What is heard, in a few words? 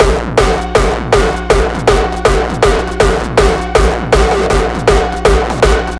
Beats Loop; Anger Foot